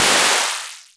Cymbal Dirty Noise, Modular

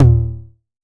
TOMS 909; Electronic; Wizoo - Platinum 24 Electronic

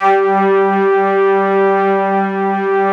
ST ORG, STRINGORGAN, Yamaha VP-1

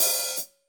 HH, Drums; Bob Clearmountain Drums I

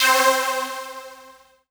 Synth Hit C

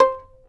fiddle pizz C5 R; Sampleheads; Whole Lotta Country 1 & 2

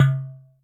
Udu 808, Percussion, Yamaha DTXpress

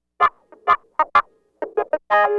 Clavinet, 70s Analog Funk; Sonic Foundry (Sony Creative Software); What it Is!

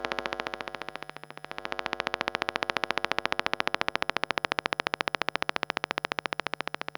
Electromagnetic Fields

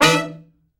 Killer Horns, SECTION 7TH; Best Service

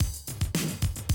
Classic DrumLoops, 110

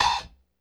LOFI HAT, LO-FI KITS; Spectrasonics - Burning Grooves CD2

